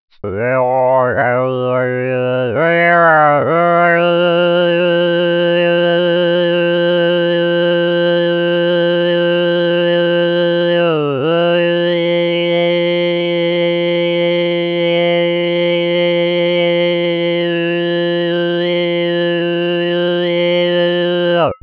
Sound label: Overtone